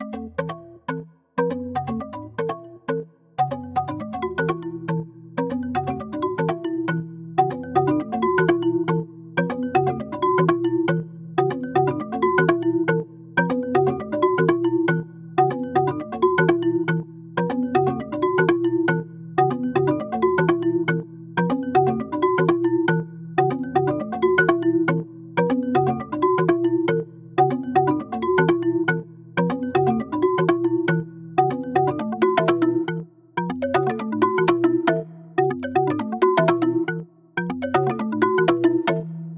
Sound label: Underscore Mallet Arps